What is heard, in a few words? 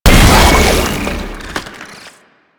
Volatile Runner Explosion; Enemies; Abilities; Proto Infested Volatile Runner; Warframe